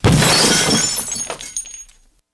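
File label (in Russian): zvuki padenii cheloveka, human falling sounds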